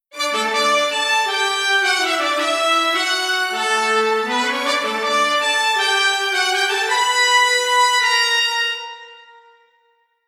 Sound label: 2section